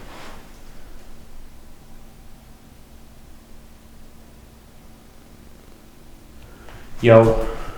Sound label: growl